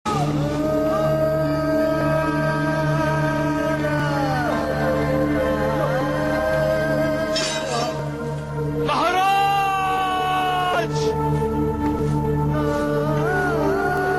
Sad BG Tone; dharti ka veer yoddha prithviraj chauhan

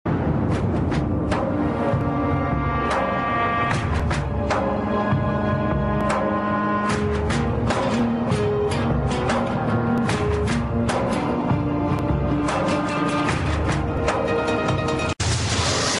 Jungle BG Tune; dharti ka veer yoddha prithviraj chauhan

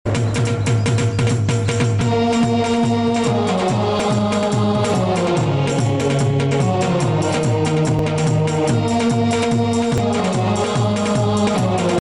BG Tune With Dhol; dharti ka veer yoddha prithviraj chauhan

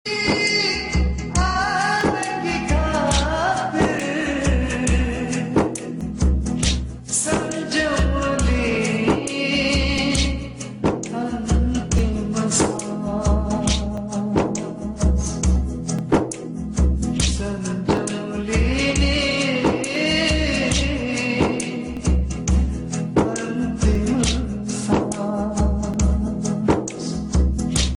Slow Reprise Song; dharti ka veer yoddha prithviraj chauhan

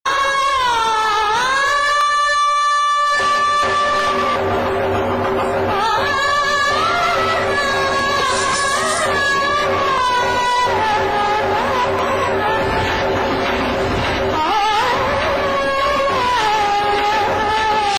BG Music; dharti ka veer yoddha prithviraj chauhan

